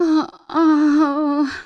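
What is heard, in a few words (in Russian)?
блаженный сладостный стон женщины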